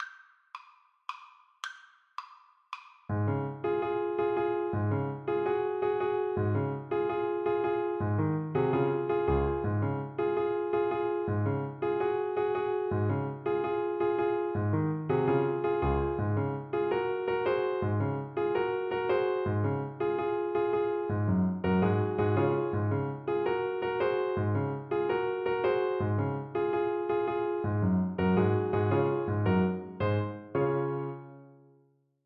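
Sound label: give us a drink alto saxophone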